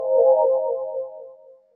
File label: agent dispatch ambient